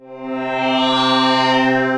Poison Afterburn Pad; Wavetable; Ambient & Evolving; Ableton; Factory Packs; Synth Essentials

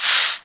Cabasa; Retro Synths; Vector; Components; Vector Attacks

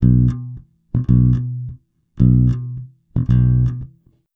110 Pop Basic Bass B, E-Bass; Pop Rock

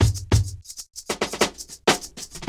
Jagged Glass Drums; Ableton; Breakbeats by KutMasta Kurt

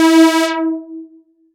Release E4, Analog Synths; Foundation; Ableton Foundation FreePacks

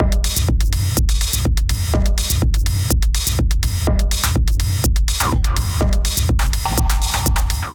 Factwerk Kit 124 bpm; Ableton; Punch and Tilt

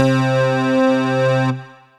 Prophecy Tug Lead, Synth Lead; Ableton; Factory Packs; Build and Drop

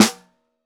SN1 Bottom, snare

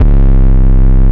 2-Way Boom Drive, Booms; Ableton; Beat Tools

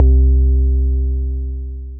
More Than 808 Bass, Synth Bass; Ableton; Factory Packs; Beat Tools

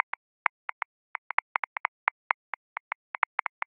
Hip Hop Funky; Grooves; Ableton; Factory Packs

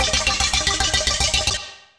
Synth Rhythmic; No Trix; Ableton; Glitch and Wash

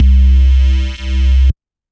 Sub Buzz, bass; Ableton; Glitch and Wash

